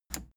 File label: plastic button scratch smooth push enable